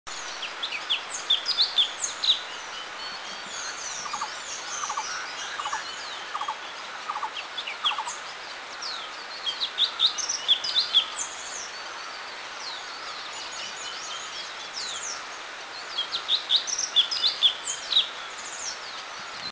Orange-bellied Leafbird; Mishmi; India

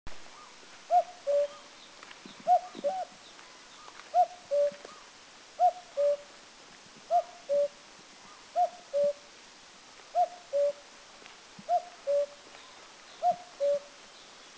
Eurasian Cuckoo; Mishmi; India

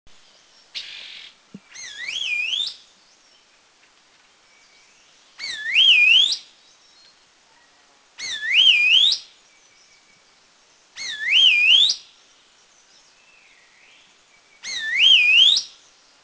Blue-winged LT; Mishmi; India

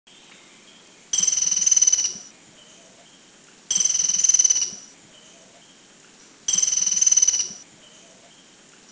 Philippine Woodpecker Makiling, Philippines

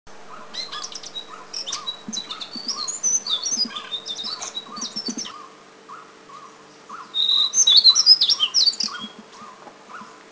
White-browed Shortwing, Thailand

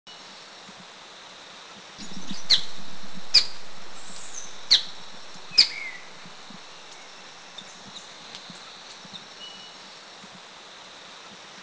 Black-and-cinnamon Fantail Kitanglad; Philippines

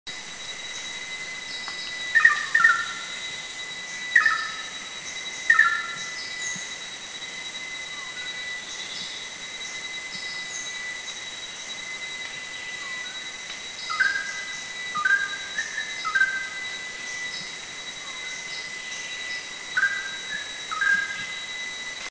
Black Magpie, Thailand

